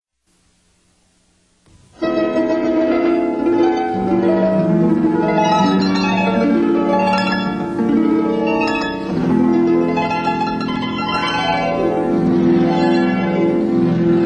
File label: harp; the NEW HARP sequences complete with L-R tape delay for S T E R E O; WGAY